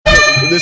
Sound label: horn ding